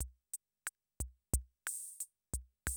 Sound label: CR78, DrumLoops